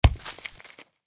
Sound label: Softball Drop Dirt, Softball Drop Dirt Roll Short; SPORTS; Blastwave